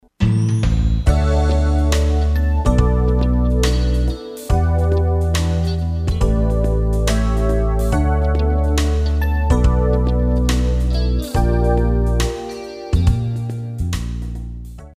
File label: musicbed sample, newage